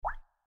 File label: pop up; waze